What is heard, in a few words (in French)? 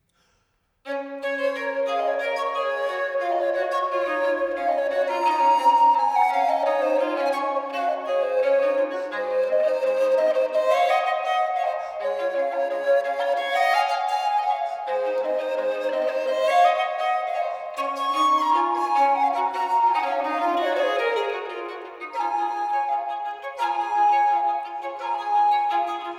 Le Rossignol la caille et le coucou